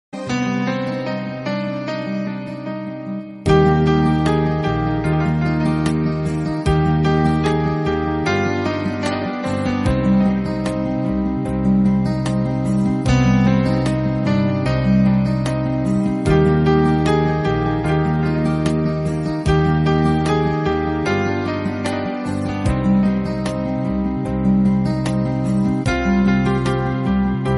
Rabindra Sangeet ringtone instrumental song love tone music tone